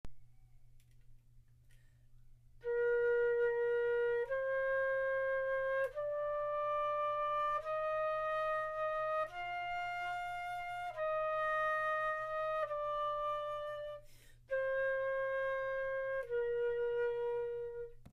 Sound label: flute